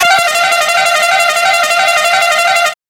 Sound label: Cycle race klaxon